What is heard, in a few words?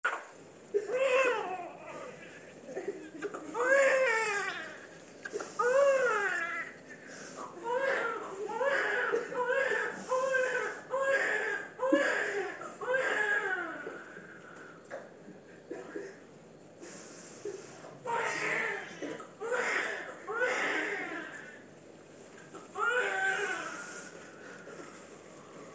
first cry